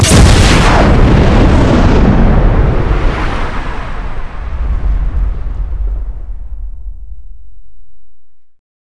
nova, Explosion; NanoFXv2; ExplosionFX; ExpNova

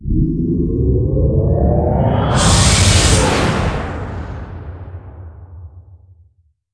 Federation Enter Warp; NanoFXv2; WarpFX

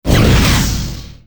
Hit Shield, Explosion; NanoFXv2; ExplosionFX; ExpShield

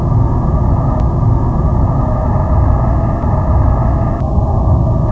Wormhole Loop; DS9FX

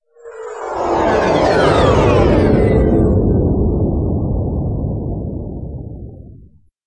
Federation Exit Warp; NanoFXv2; WarpFX

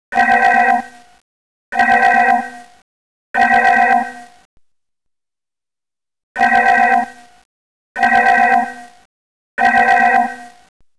red alert

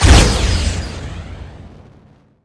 photon type 6 foehammer

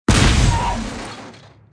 shield; NanoFXv2; ExplosionFX; ExpShield

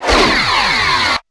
Fed Medium

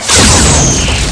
black elm cardassian torpedo

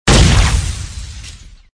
shield; NanoFXv2; ExplosionFX; ExpShield

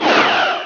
Cardassian

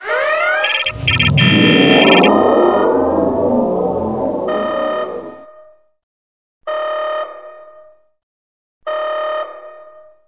red alert; ebridge

